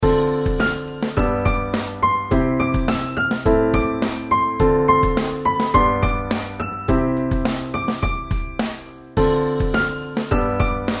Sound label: 3step mix